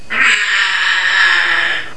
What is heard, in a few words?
growl, parrots